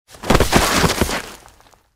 padaet i soskalzyvaet

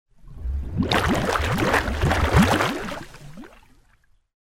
puzyri vyrvalis na poverhnost

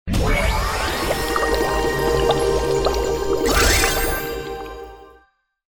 zvukovoj jeffekt volny

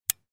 odin povorot barabana

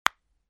zvuk hrusta drugogo palca

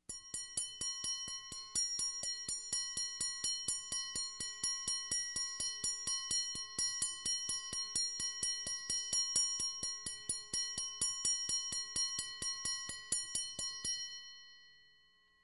Kolokolchik korabelny; Колокольчик корабельный; Колокольчик корабельный №1 — 14 сек

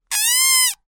vozduh iz sharika s piskom

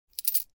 perebirajut monety v ruke

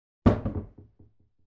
zakrytie poblizhe

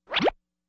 komichnye kapil effeckt

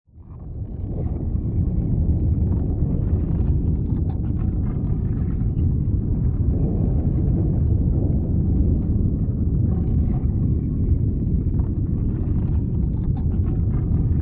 gul

